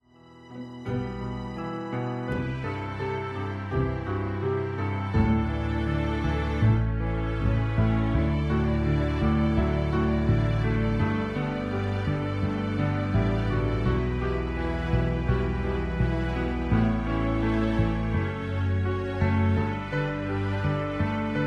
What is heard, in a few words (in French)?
instrumental